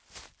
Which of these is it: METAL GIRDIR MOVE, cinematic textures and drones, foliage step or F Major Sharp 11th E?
foliage step